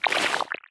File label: wriggler walk, enemies; Factorio